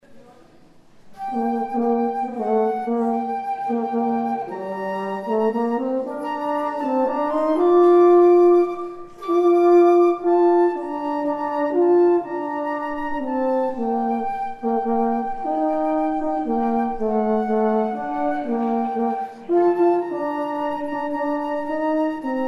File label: Flute Horn Duets; Cantate Domino; Giuseppe Pitoni